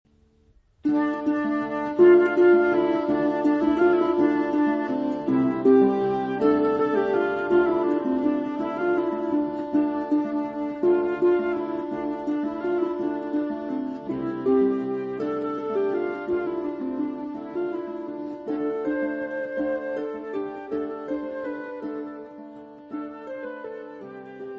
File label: Medieval Melody